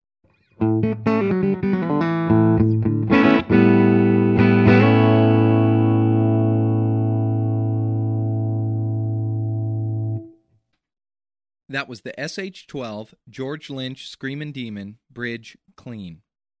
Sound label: clean bridge